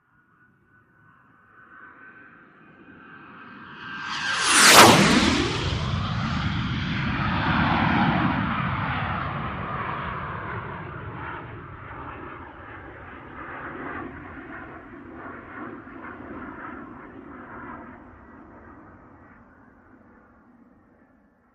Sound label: Gnat By Low Fast Whist